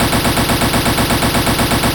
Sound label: Machine Gun 8 Bit